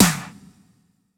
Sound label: Elec Snr, drum